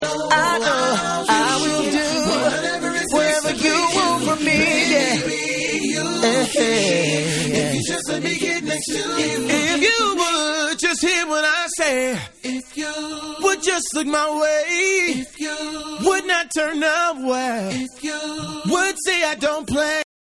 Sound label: Acapella